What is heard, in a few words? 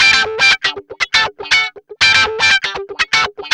GUI, 120 BPM